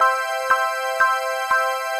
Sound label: Loopable; balloons-and-static-electricity